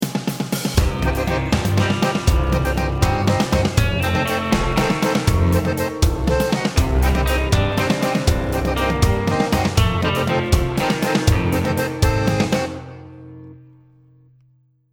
start round

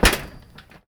metal grate, player footsteps

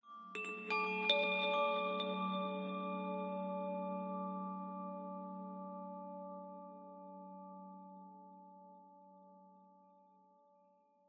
ambient abstract chimes high; Half Life Model Pack

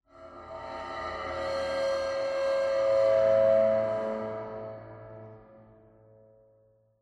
cymbal med; ambient; cymbals

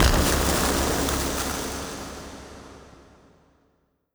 weld, strider; creatures; Half Life Alyx

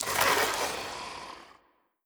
zombie scratch, brickwall

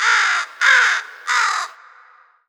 bird bird bird; Portal 2; threesies

